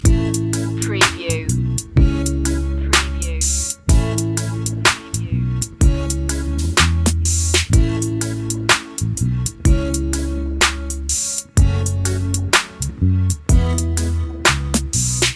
sweet soul loop

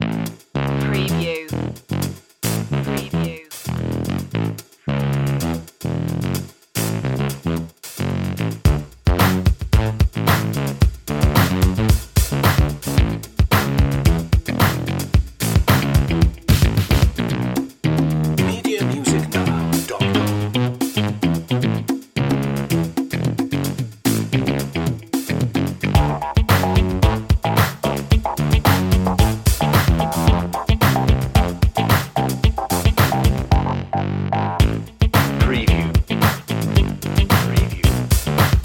cute n fun loop

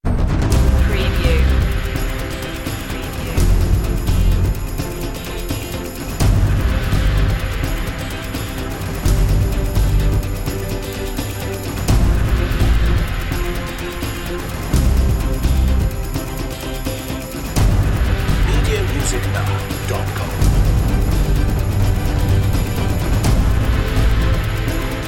song of glory no choir

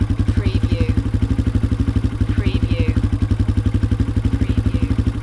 Motorcycle Dirt Bike Idle Loop

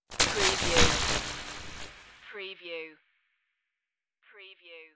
electric space glitch

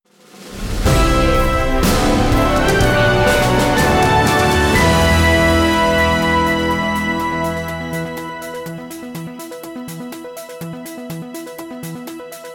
news flash intro

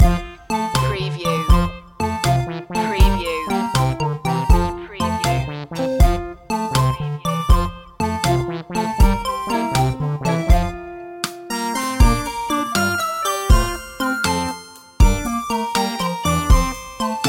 retro video game lazy world loop

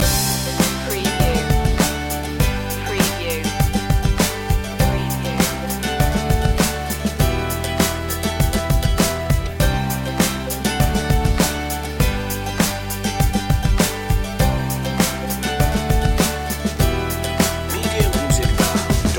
family tree loop

